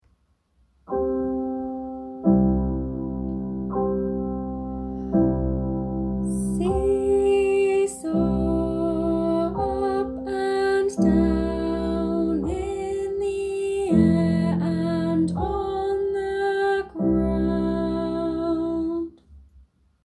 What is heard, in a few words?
seesaw song with piano